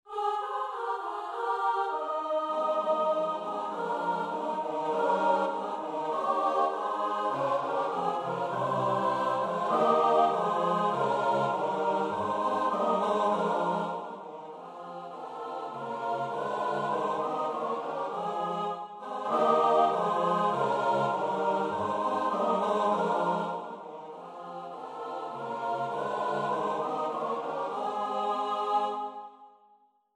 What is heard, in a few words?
midi; rzepko; wesolo spiewajmy